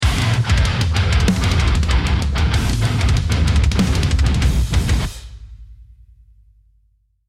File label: deathcam